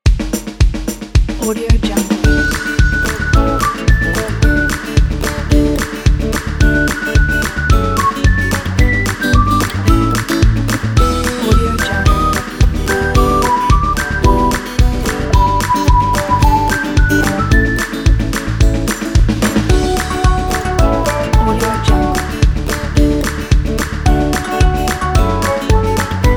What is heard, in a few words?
Acoustic Fun